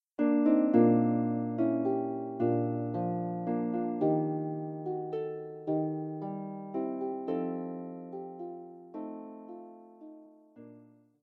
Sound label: the old rugged cross, Harp